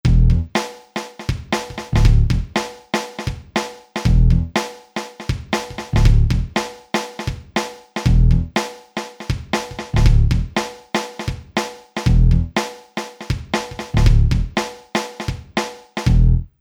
Rap Hip Hop

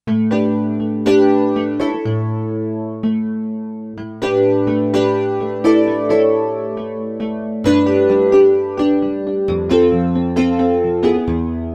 Nylon Rhodes, Roland SC-880; Factory Patches

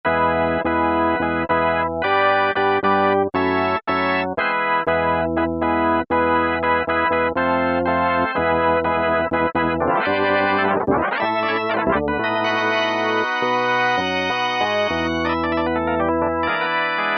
Korg microKORG Rock Organ; Factory Voices

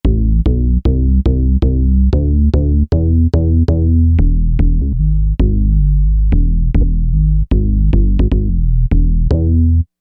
Pure Bass

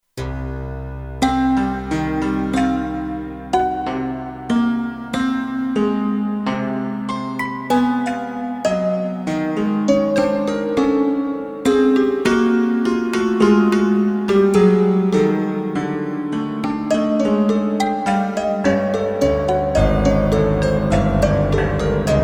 Prepared Piano; Factory Programs

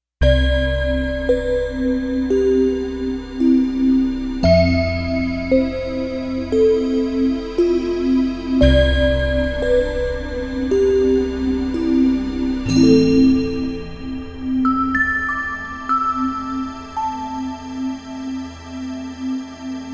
KRISTAL PAD; Digital Synthesizer

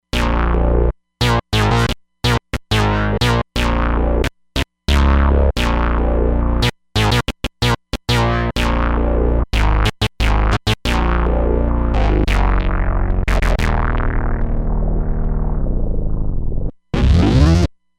Sub Oscar, synth

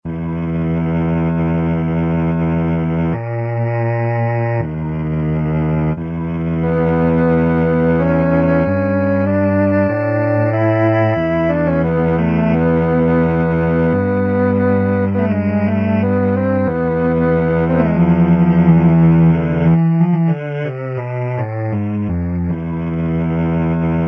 Tron Cello; '60s & '70s; Roland SR-JV80-08

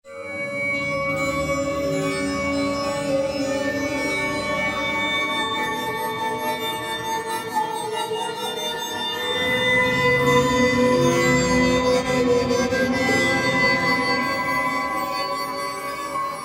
Roland V-Synth; V-aterphone; Factory Patches

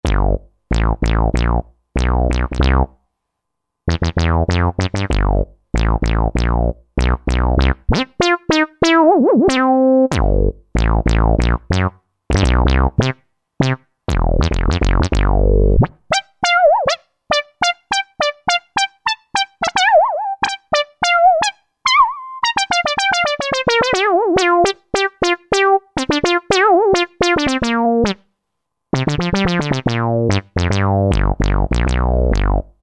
Reso Ld; Roland SR-JV80-12 Hip Hop Collection

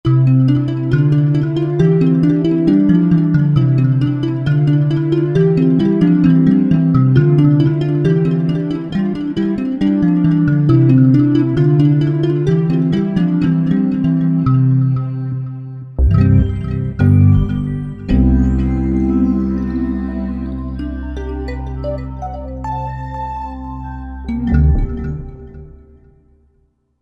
Waffle Mallot, Korg TR-Rack

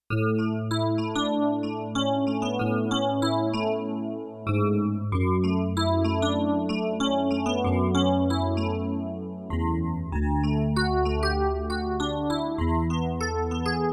Bell EP-dual, Yamaha TX16W; Combo Performance 2

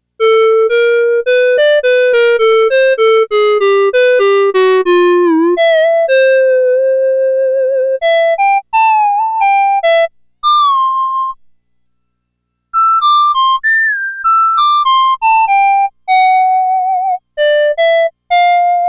Clarinet

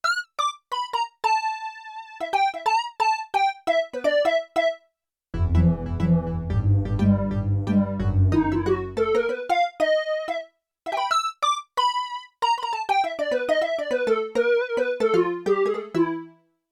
Percussive Lead, Synth Set, Yamaha TX16W

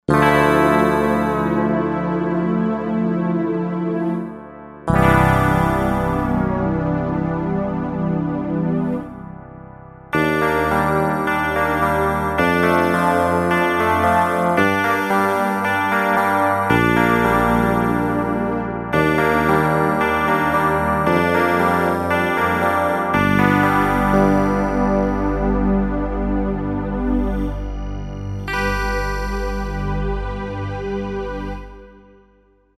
Vector Guitar, Korg Wavestation A-D

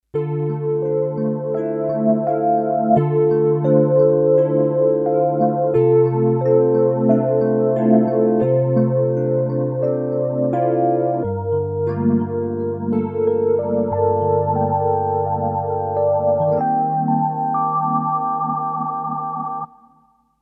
Soft Ep, Kawai K5000S factory presets

